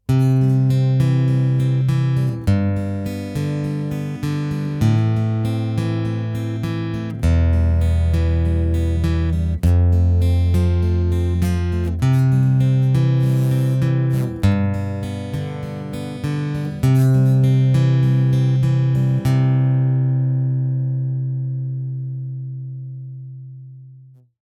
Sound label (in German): Zupf; Zupfmuster 1; Begleitgitarre; Hörbeispiele Begleitgitarre